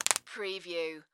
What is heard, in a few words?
Crack Knuckles